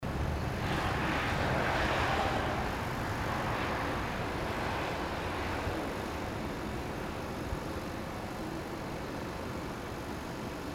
Straße 120 Grad

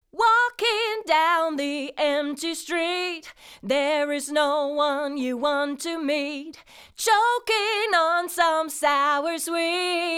Summit Audio TLA 100A Vocal Attack Medium Release Medium max. Reduktion 6 dB

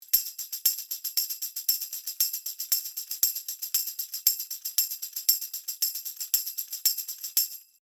Austrian Audio CC8 Tambourin 50cm

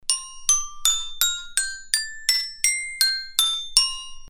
Sontronics STC 20 Niere Xylophon

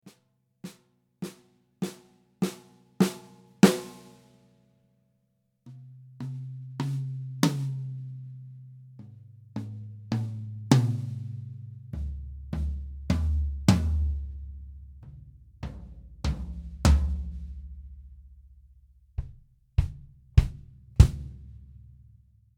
Trommeln einzeln

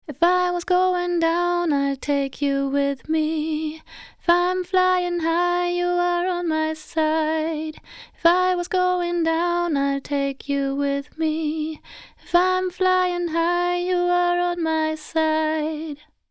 Chandler Limited RS124 Vocal Attack 9 Recovery 2 200 Ohm max. Reduktion ca. 10 11 dB

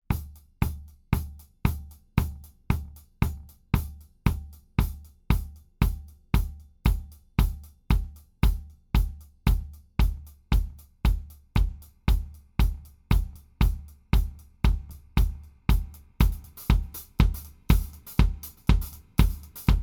Mikrofon pur Birch Kick Fade In Reverb Fade In; Yamaha EAD10

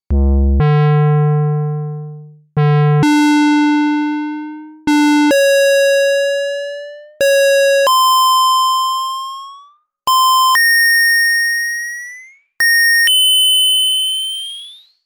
Selbstresonanz SE80

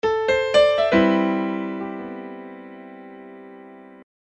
Klangbeispiel Piano 120 BPM Original

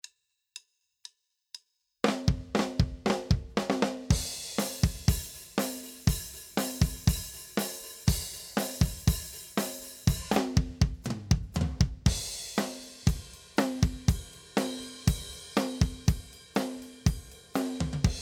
Drumbeat mit CBM-01 Mikro bearbeitet

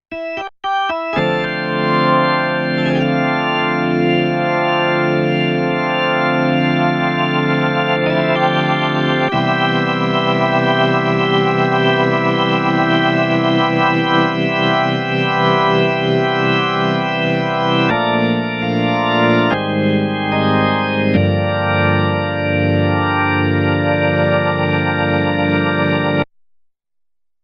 Yamaha CP88 Orgel

